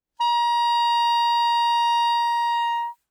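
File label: Soprano Saxophone non vibrato ff Bb5; Woodwinds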